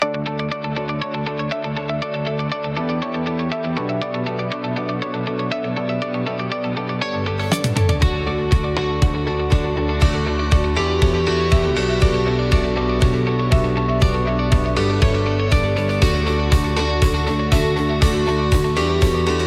driven by purpose a serious motivational corporate soundtrack